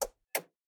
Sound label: turning signal; vehicles; NS Studios - ultra sound collection